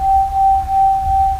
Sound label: Chimes; TRUTH-HZ; Crowdsourced Sample Pack